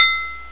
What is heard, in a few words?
got Energized Snd; Breaker 1.0